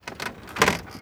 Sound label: foot wood creak, footsteps